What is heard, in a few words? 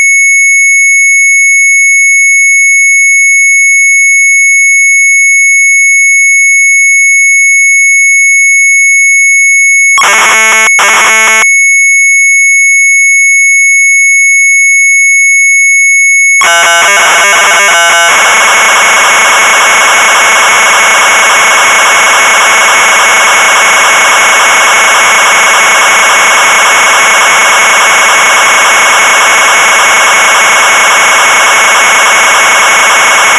Sharp MZ800 Tape; retromelsarchive